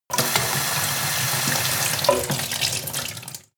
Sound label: sink water pot medium